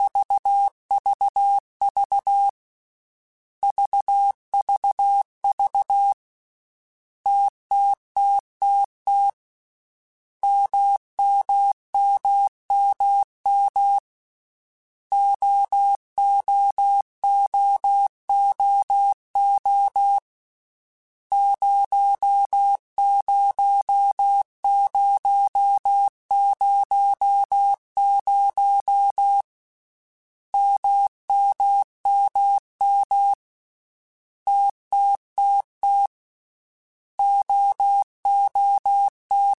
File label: TMO0, Morse Code; HamRadio; Basic Sets